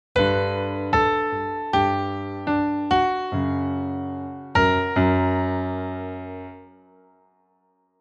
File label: chorus second half